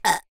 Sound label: human burp short child 5 years old